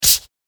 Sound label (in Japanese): カードを配る